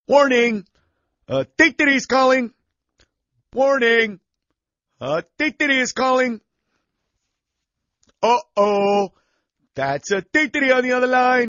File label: Warning Dee Dee Dee